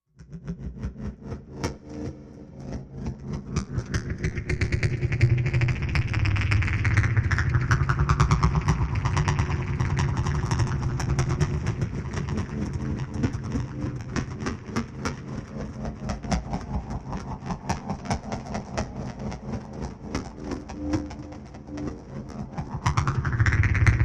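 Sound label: Pinion Reducer Machine, energy beams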